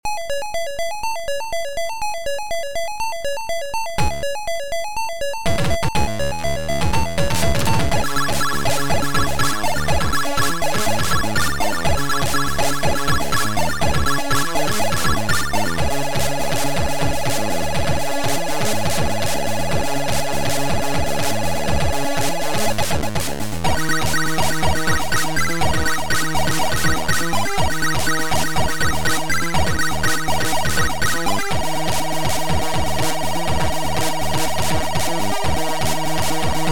BUZZ; Snowman

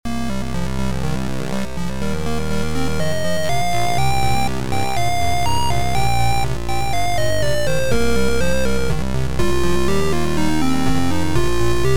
8 BIT

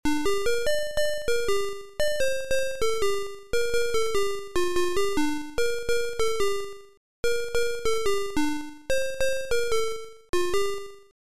GROOVE

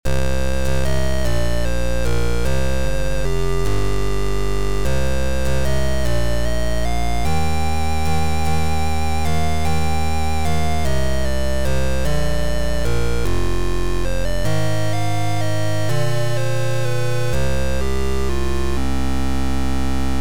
Soft Cannon Bubble game over

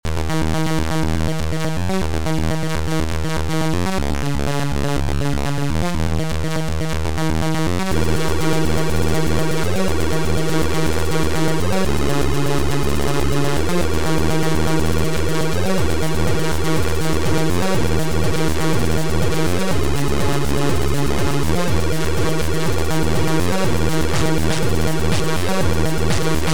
Fast Enveloped Song

